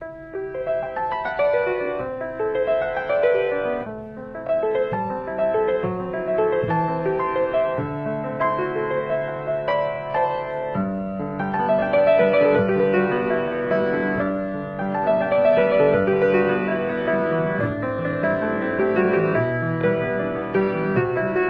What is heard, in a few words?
Piano Ringtone